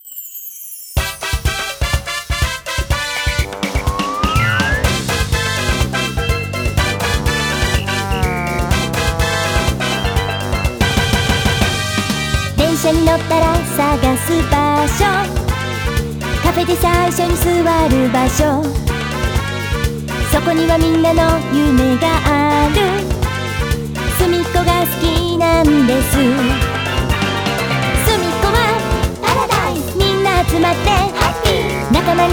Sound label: BGM Song Short